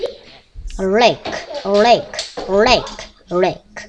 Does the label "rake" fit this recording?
Yes